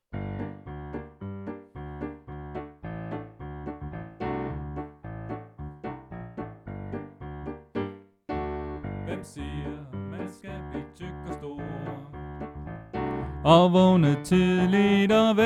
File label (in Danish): Tenor; oevefiler